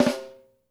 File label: SNARES; Sampleheads - New York City Drumworks VOL-1; SW SNARES